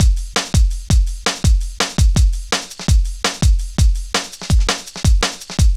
Drum Loops, Jungle, 166.5

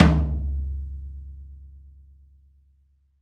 Folk Tom

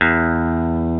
BB GUITAR E1; E-MU Formula 4000 Series Vol. 5; Protozoa

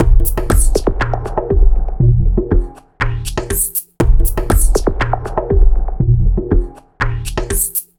Electro Loop, Drum Loop; Sonic Foundry (Sony Creative Software); The Electronic Music Manuscript Collection; Drum Loop Structures

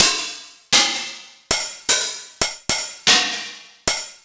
909 mod OH - OPEN HAT

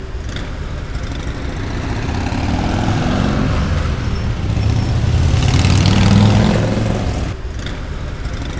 CAR BYE, City Ambience; SFX

